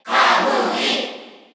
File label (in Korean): Cheer Korean; Corrin; SSBU